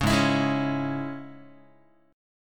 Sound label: Ab Suspended 4th Sharp 5th, Guitar; strummed; JGuitar